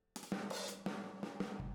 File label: Boogaloo - Room M, 82 BPM; Drums; Intro; Sonic Foundry; Drums from the Big Room The Mixes